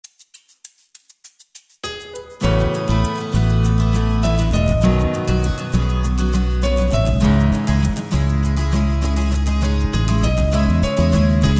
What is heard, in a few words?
Acoustic